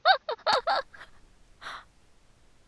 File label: inhalation and voice